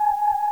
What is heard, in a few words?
button flutter micro